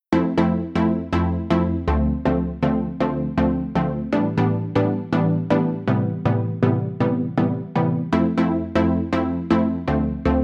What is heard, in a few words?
Synth Pluck Delay Off